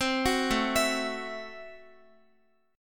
A Minor 6th A Guitar; x,x,10,11,9,11; arpeggiated; Am6

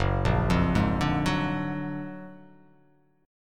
Gb Augmented Major 9th; Gb+M9 arpeggiated; JGuitar

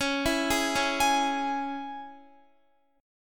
Db Minor Db down Guitar; arpeggiated; 4E,4A,5D,5G,5C,6F

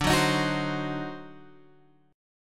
D 7th Flat 9th, Guitar Open G

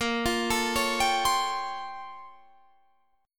A sharp Minor Major 13th, down, Guitar, Open D

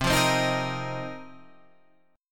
Bb Suspended 2nd Suspended 4th C

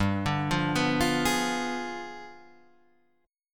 D Diminished 7th G

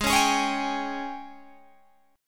Ab Major 7th Suspended 4th Ab down Guitar Open G

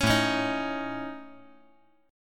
A Major Flat 5th

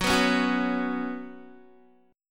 F sharp Suspended 4th, Guitar; strummed; F#sus4

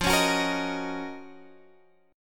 F sharp Major 13th F

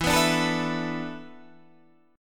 E 7th Suspended 4th

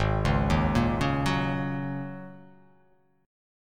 G sharp 9th Suspended 4th G sharp

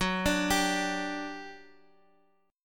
G 5th F sharp, chord; G5/F# Chord {14 17 17 X X X} arpeggiated

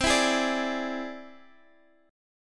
E Augmented 7th C

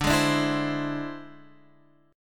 E Augmented 7th D

